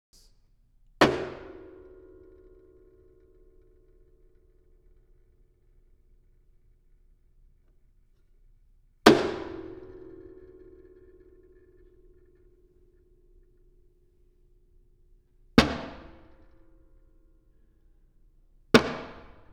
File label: case noises; razzo